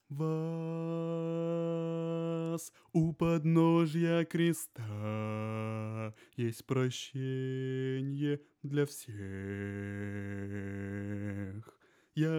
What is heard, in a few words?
bass